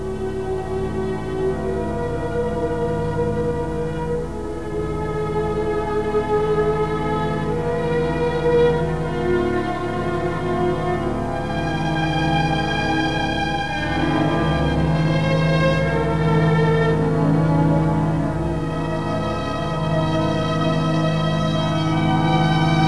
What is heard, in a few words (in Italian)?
andante